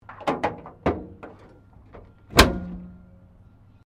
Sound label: Car Holden FE Bonnet Close; Classic Australian cars; Vehicles